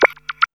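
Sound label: synth blip; E-Lab; X Static Goldmine 1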